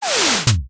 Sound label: starter camera transition